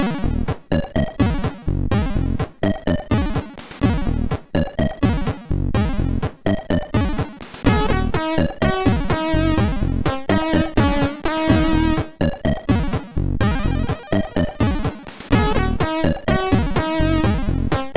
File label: sid MOS6581R4; Day I Moved On